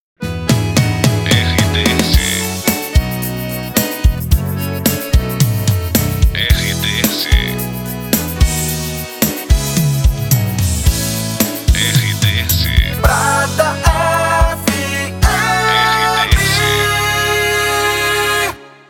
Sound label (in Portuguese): SB RADIO COM SERTANEJO